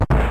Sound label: door open; zsdx